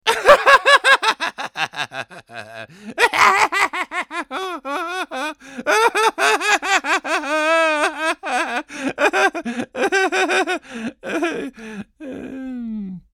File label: Evil Laugh